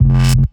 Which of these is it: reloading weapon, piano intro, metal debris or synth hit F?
synth hit F